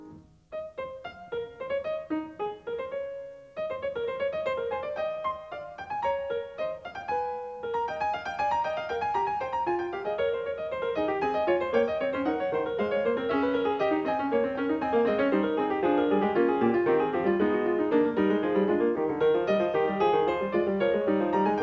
Fugue No. 17 in A-flat major, Bach, Well-Tempered Clavier; BWV886